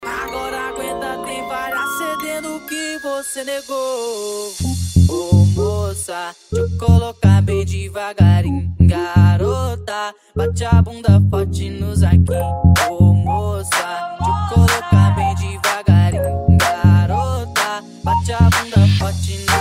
sound car mala aberta e mega funk

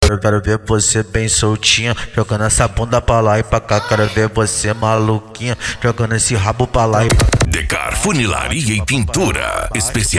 funk ritmado

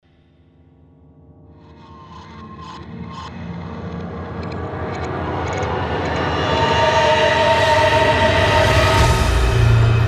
sertanejo e pisadinha

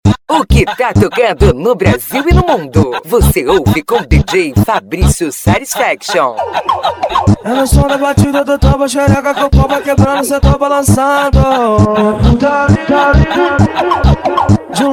funk e lambadao

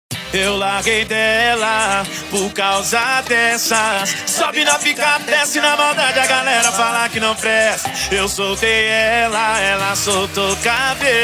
electro funk

